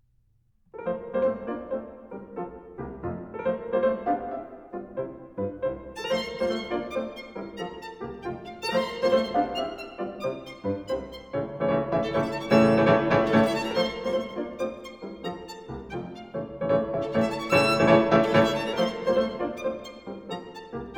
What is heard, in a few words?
Sonata for violin & piano in F major, Scherzo; Sonata for violin & piano No. 5; "Spring"; Allegro molto